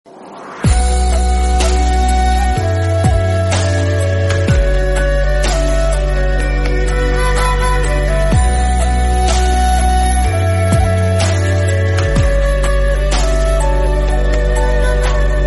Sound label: Tamil Bgm